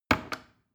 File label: plastic button small click heavy slow